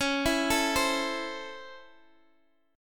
Db Minor Major 7th Sharp 5th Db down Guitar Half Step Down